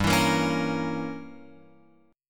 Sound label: G-Diminished 7th-G-down-Guitar-Open F; strummed; JGuitar